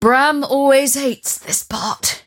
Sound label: teleport pain; dota; mirana